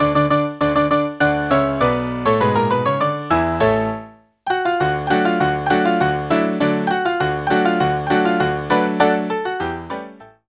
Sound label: Example music